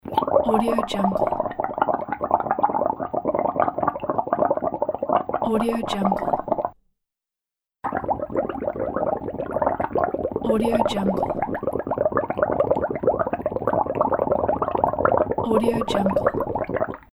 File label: gargling